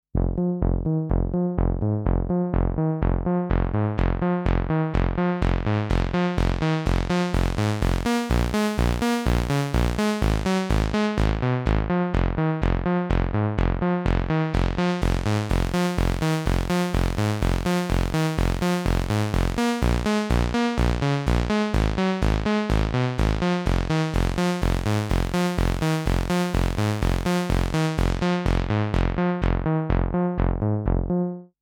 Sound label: Summit Audio TPA 200B Synth clean